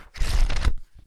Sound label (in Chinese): 向后翻页; UISound